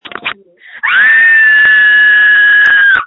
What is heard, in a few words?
screams